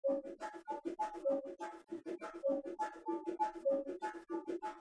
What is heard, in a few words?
Bongo